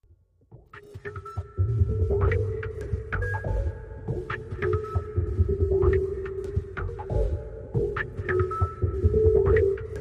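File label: Marimba Machine Rhythm, musical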